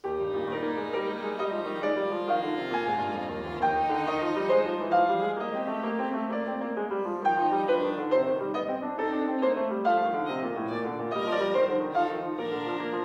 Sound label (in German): Violinsonate Es-Dur - Variation V; Mozart; Chamber Music; Violin Sonatas